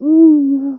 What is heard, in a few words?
under moan f; Interactions